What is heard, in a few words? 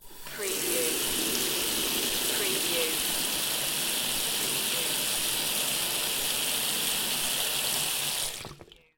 Bathroom Water Tap